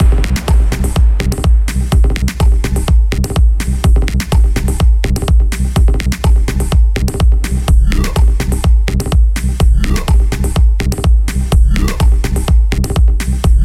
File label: dubstep; Tyke & Sons Lumber Co